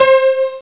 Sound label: piano ac mod; orchestra, keystring